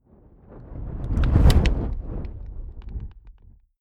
fire whoosh crackling; swooshes-swishes

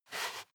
step barefoot wood plank slide short; Footsteps; schritte

